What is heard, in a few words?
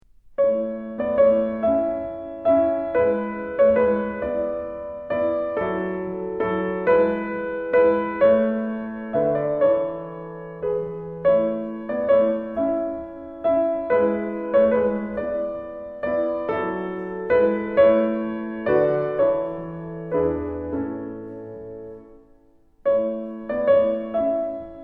pianosonata; モーツァルト; ピアノ・ソナタ第１１番（トルコ行進曲を含む）; 第１楽章; 演奏は黒瀬紀久子さん